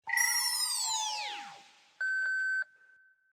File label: death sound; sao